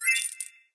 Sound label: Money Pickup